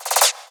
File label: Misc Short FX